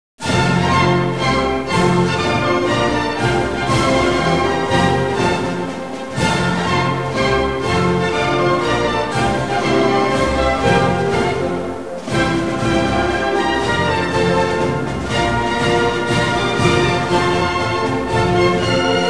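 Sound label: polish anthem; polish athem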